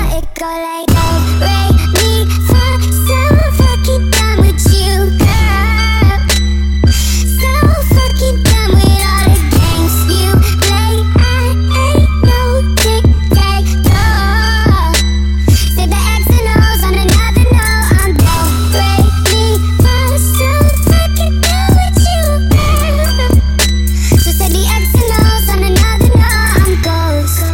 High do re mi

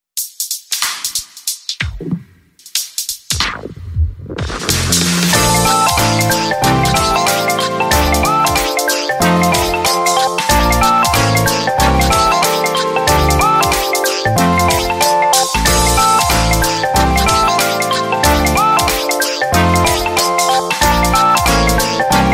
quiet room

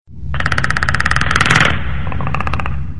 predator growl

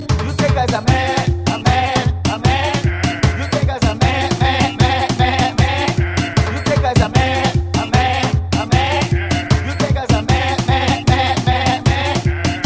Soca

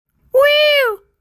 Weeoooo

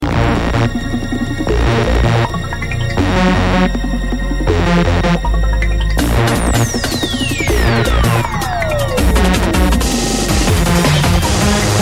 Space Electro Break

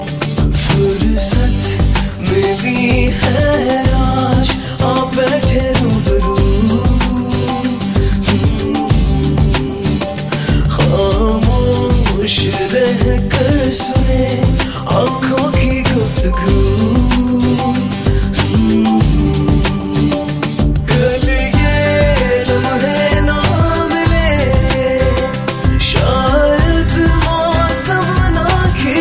Sad Song

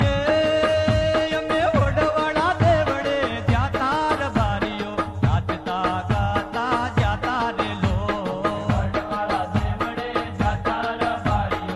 Rabari Fusion

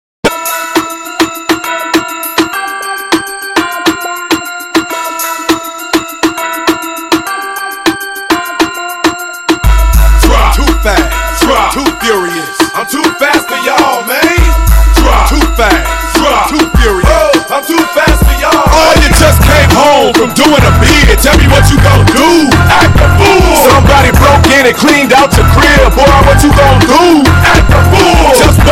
Remix Fast

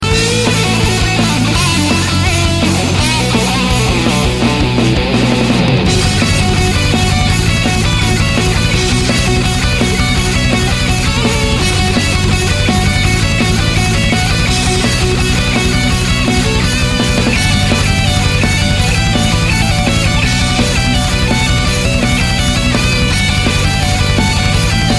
Rock; Sonic

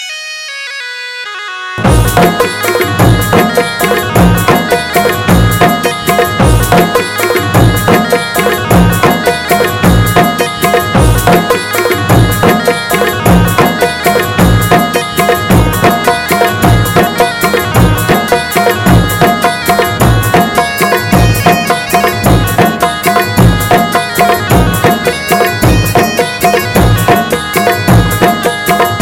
Bagpiper

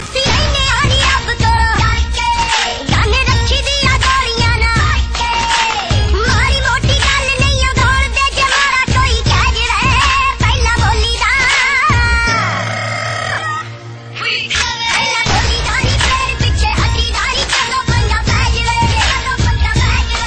Chipmunks

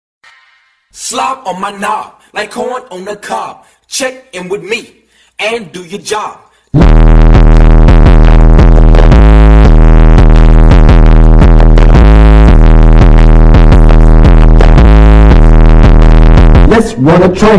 Bass boosted

